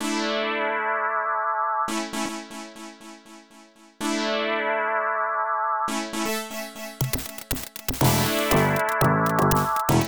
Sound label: Drum Loop; shooting Tsukuru V; IBM PC/AT